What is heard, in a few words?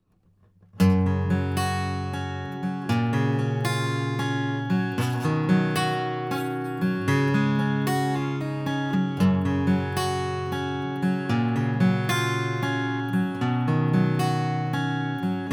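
guitar pick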